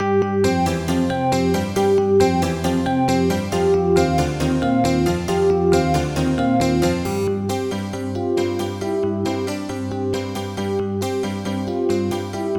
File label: synth matrix